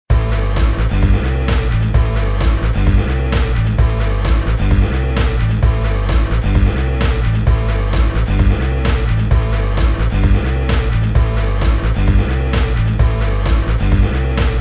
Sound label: groove